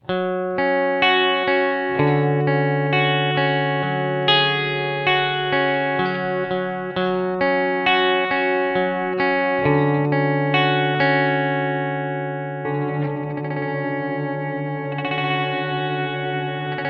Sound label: Mood Mk2 Slip Stretch